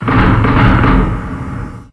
creak

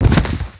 zom knee, zombie

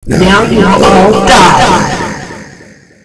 ice queen die; npc

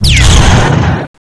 cannon

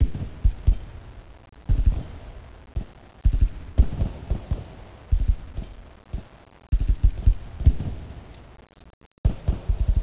ambient guns

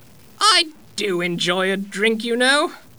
girl drink

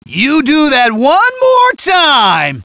hurt soldier

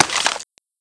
greaser draw, weapons

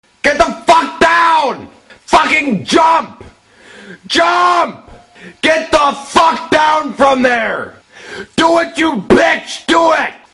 jump

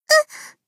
jump; ba miyu

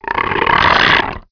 zombie poison attack

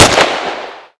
sniper shot; HL2

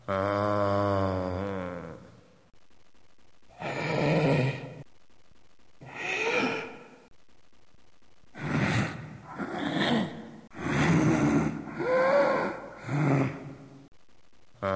Distress

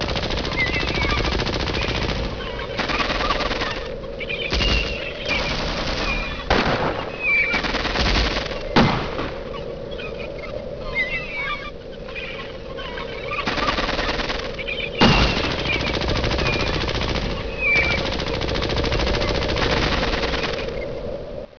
gun city old

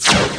zzap

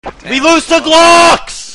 glocks

